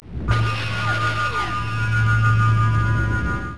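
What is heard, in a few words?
force field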